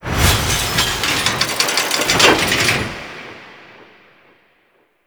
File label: Arsenal Open; Interior; Ambience; Warframe; Nineteen Ninety Nine; Tailor Shop